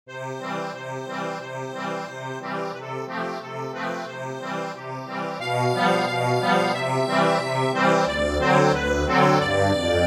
ACCORDION

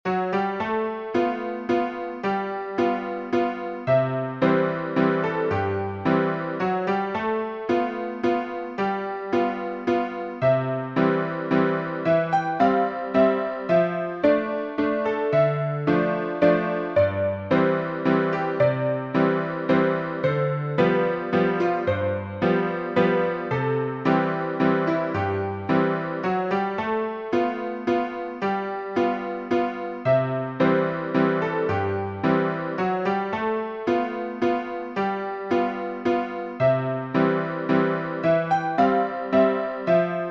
piano, sheet music

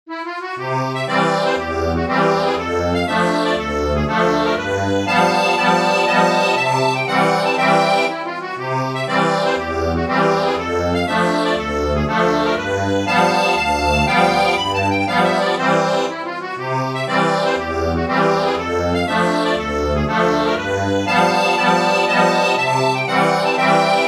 ACCORDION

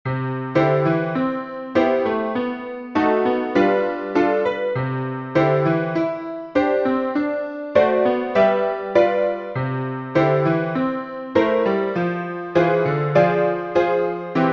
piano, sheet music

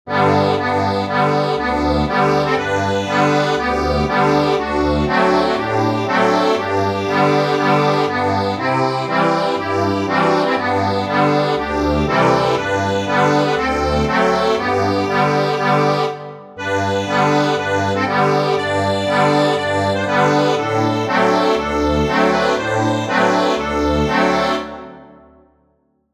accordion

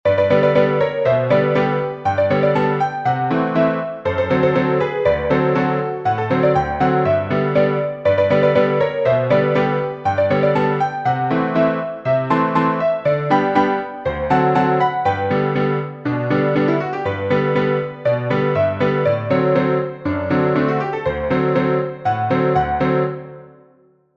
PIANO

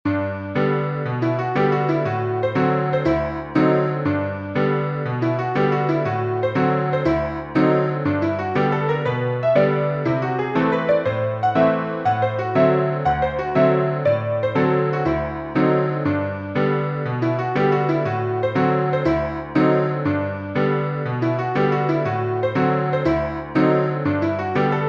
PIANO

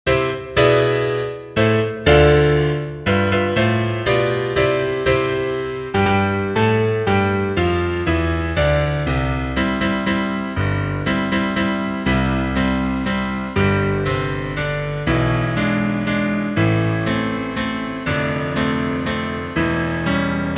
PIANO

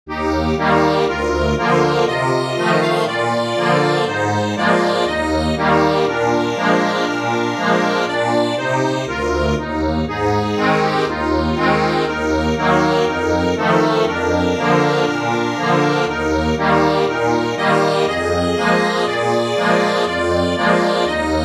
accordion, sheet music; Earth below is teeming; HARVEST

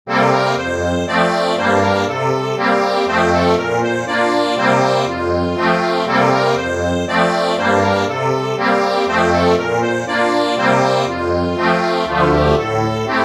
accordion

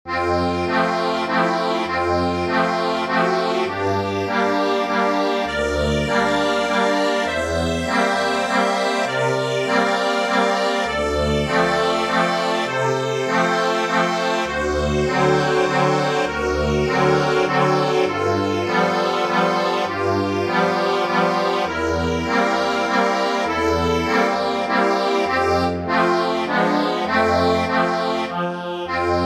accordion, sheet music

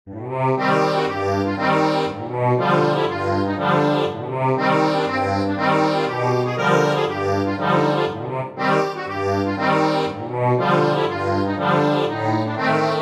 accordion

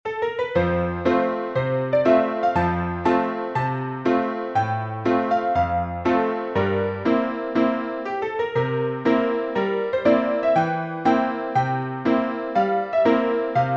piano, sheet music; Old Drury

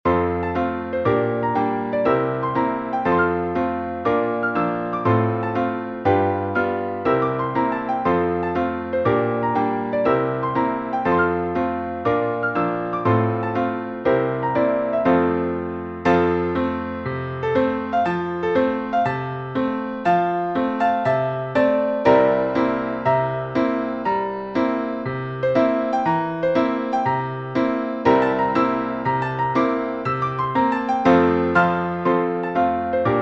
piano

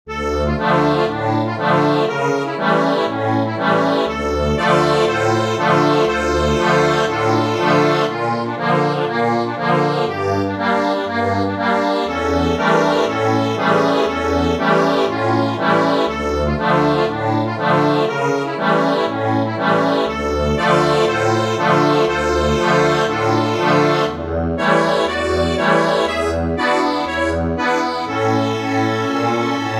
accordion

